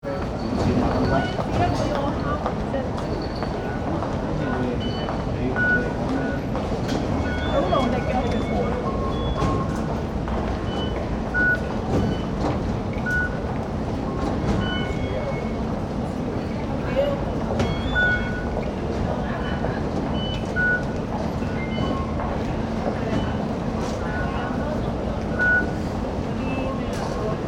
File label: hong kong metro beeps; Beep sounds, Hong Kong metro